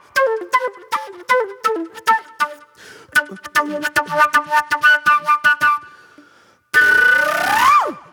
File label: Old Soul Flute